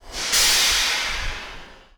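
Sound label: Emergency Brake, Train; OpenBVE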